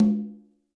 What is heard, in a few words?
Acoustic Percussion One Shot